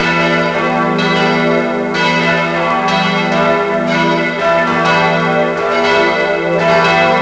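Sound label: church bell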